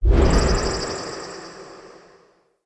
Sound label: Fey Ward Flock Cast